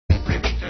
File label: ribbit